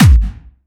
Harder, kicks

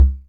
kicks, Bass Drum

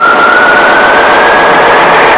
Ancient Noise FX; Sound FX

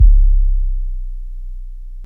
kick

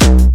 bombo, kicks, Hardcore

